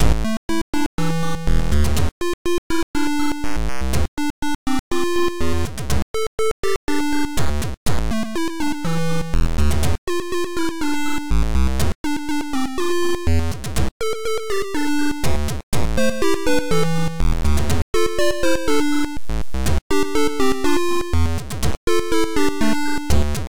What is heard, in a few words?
Beeper